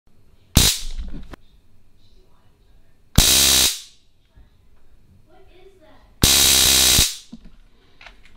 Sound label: stun gun real intense